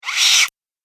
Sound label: Robot Claw Retracted; Robot Sound Effects; Sci-Fi Robot Sfx